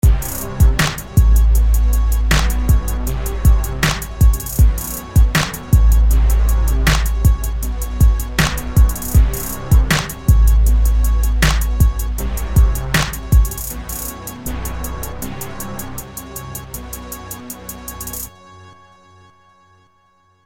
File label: rap